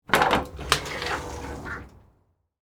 Door Open Glass, Foley, Door, Open, Glass, Storm, Door